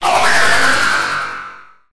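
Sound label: die, alien controller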